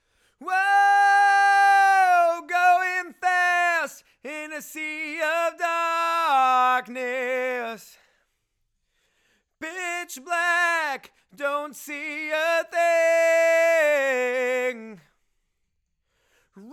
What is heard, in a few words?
LV Choru